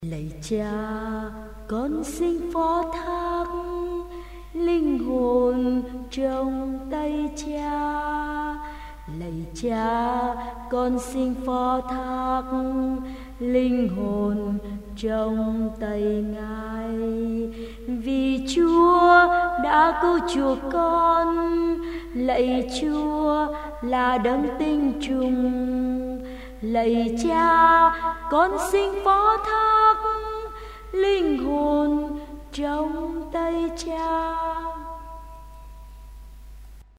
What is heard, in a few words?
alto basso